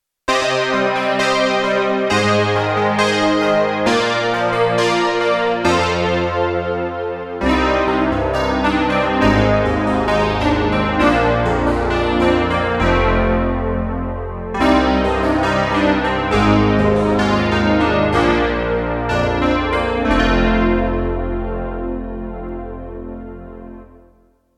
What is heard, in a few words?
piano fm; micromonsta2